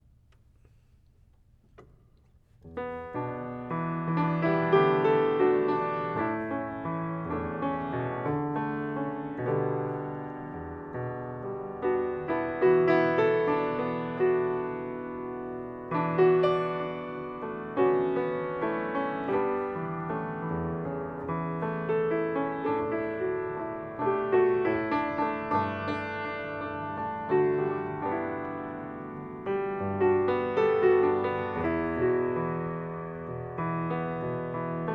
E minor chords

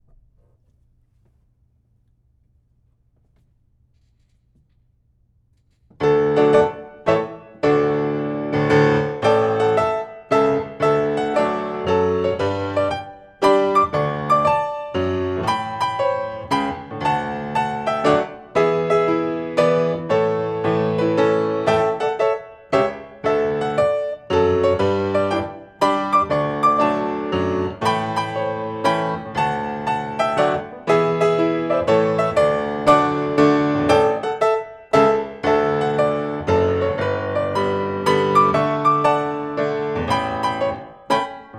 mazurka slower; Coppelia

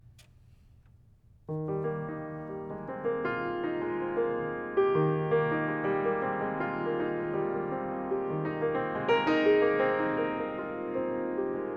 Eb shimmer

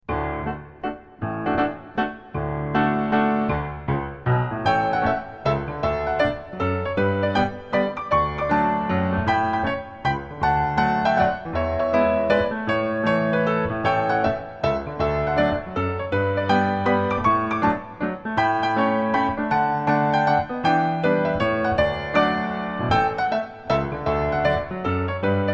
mazurka; Copellia

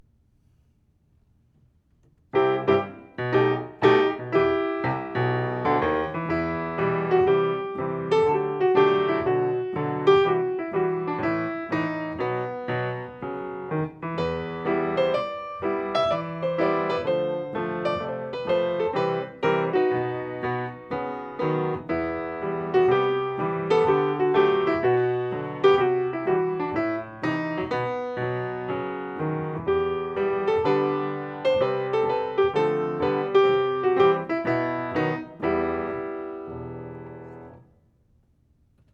pavanne 113bpm; Faure

